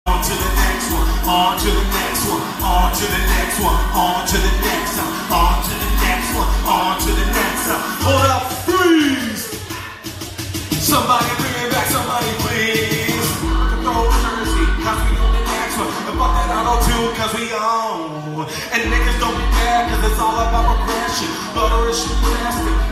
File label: Karaoke